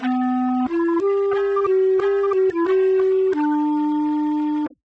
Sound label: separated clarinet